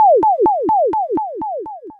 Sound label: Uniscanner, Retro Games Sound FX